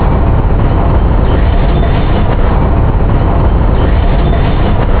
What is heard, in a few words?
drumroll; sven; ragemap2022; adambean